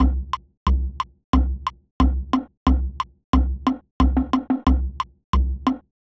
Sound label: meter accents simple